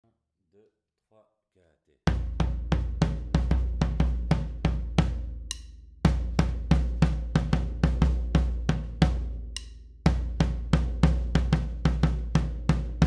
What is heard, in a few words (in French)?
Reggae Ternaire Bass, Batucada